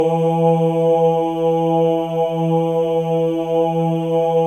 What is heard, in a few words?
MALE OHS; Spectrasonics; Symphony of Voices